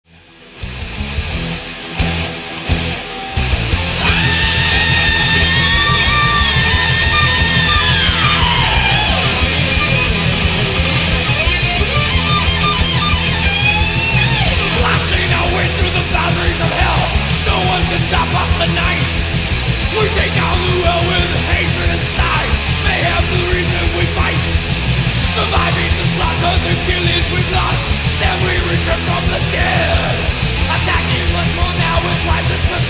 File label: speed metal